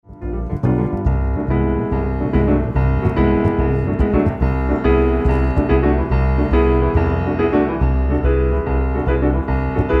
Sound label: ewi piano duet